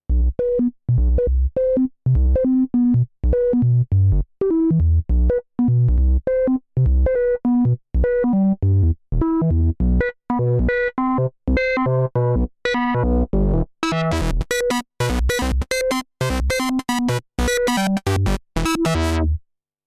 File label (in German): Comp Two V2 Synth Overdrive Kompressor; Rockruepel